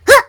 Lurker Startle

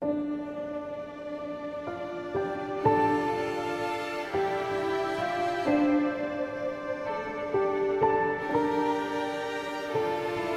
Cutscenes Story Beats Sorrowful

